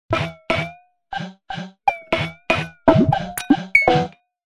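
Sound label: game background music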